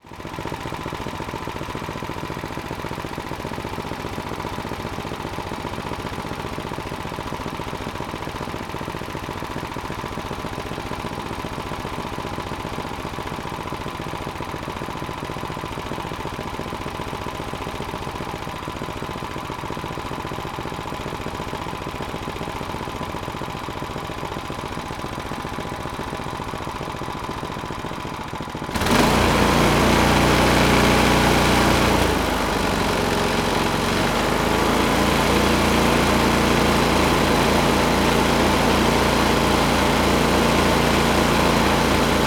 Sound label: Snow Blower, Mastercraft, 10.5 hp, 30 inch cut, Idle, Low to High, Off